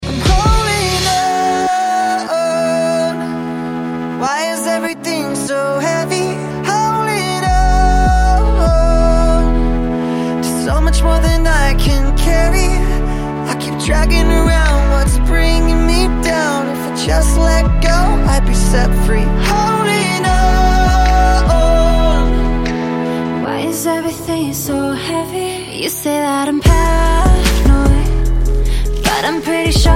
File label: heavy